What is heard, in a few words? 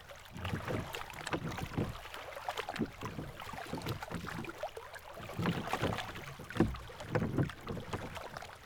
boat lake loop